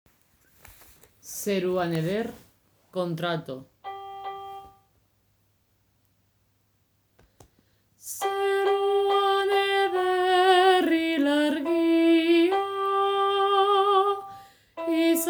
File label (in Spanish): Zeruan Eder Ilargia Contralto